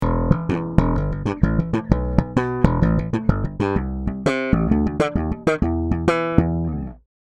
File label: bass slap; model32; fleabass